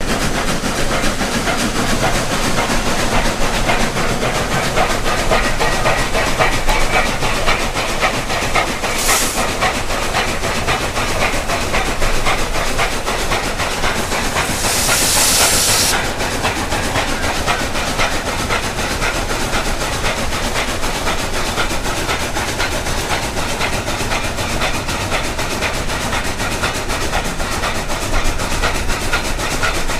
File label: Train Chugs Steam, steam train